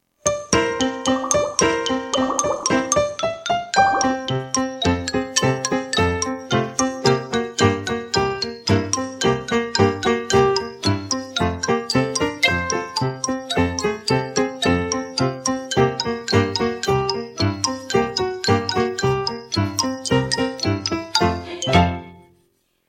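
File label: backing track; pesenka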